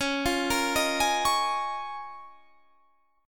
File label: D sharp 7th Suspended 2nd Suspended 4th C sharp